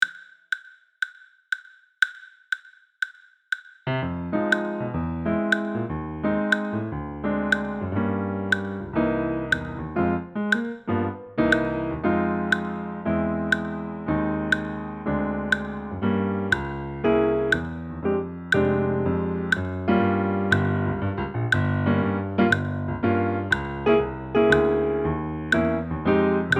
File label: Eb piano, sax